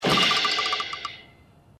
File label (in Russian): anime zvuki